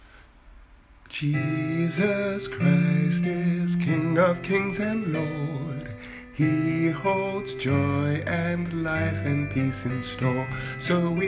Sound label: Voice